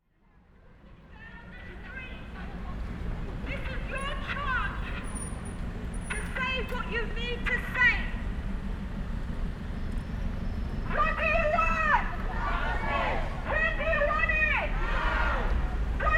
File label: Anti child abuse march